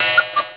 clock; gemdropx

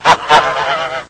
gander, birds; stamps; animals

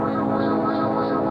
spiral square; tuxpaint; magic

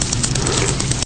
squirrel, rodents; animals; mammals; stamps

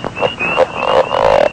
frog, amphibians; animals; stamps

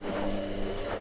Washing machine; household; stamps

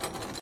trochoids drag; tuxpaint; magic

